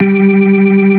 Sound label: G3, Keyboards Old School; USB Soundscan vol.09; AKAI; SMALL B3